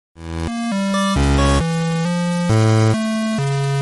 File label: noot noot 8 bit